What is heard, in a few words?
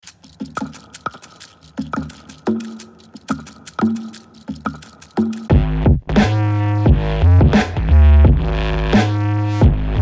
graveyard games instrumental